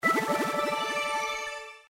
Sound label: fish entered; ThreeHeadedDragon